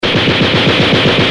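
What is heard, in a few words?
machine gun; army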